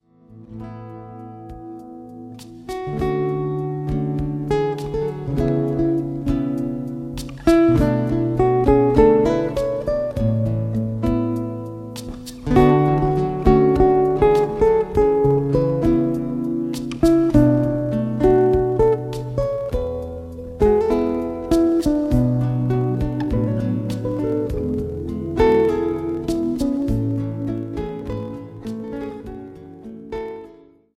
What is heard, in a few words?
Instrumental